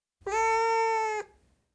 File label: Siamese, Individual Meows, Cats; BBC Sound Effects Library